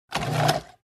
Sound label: Drawer pulled out